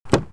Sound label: Dodge Ram open Door; Vehicles